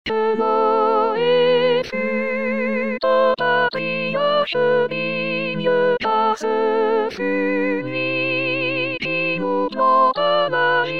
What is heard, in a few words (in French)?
bon vin soprano; 2019 st jean